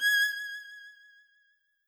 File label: violin